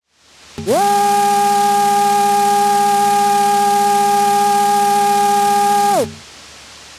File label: print quiet high darkness 4ips, printer; zt231